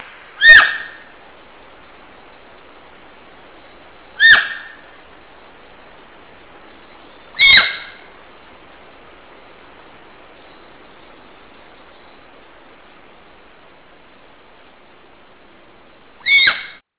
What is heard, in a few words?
deer; Sensorium; kawasaki